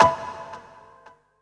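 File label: percussion fx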